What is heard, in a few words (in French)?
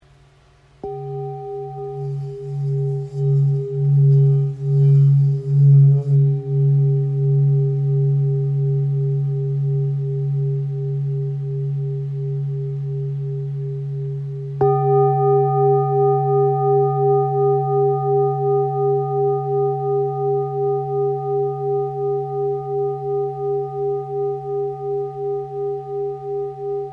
Bol therapeute do diese